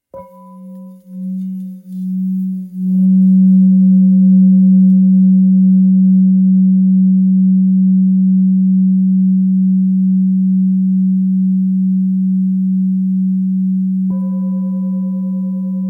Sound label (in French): Bol super lune belier fa diese